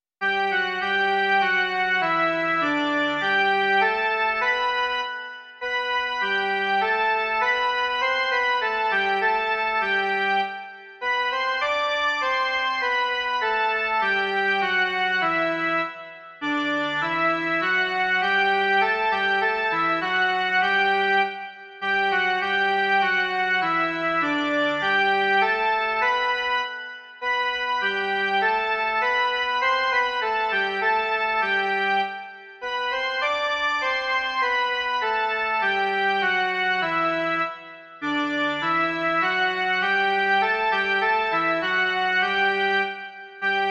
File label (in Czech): jen melodie; Buď sláva Bohu na nebi